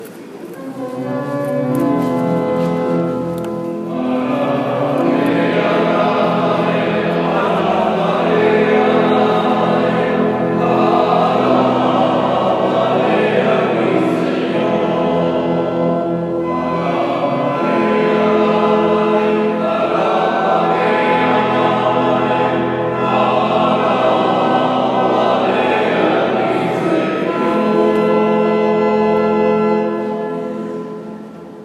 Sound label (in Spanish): Canto Inicial